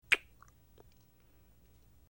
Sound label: Kisses Sloppy Wet, lip smack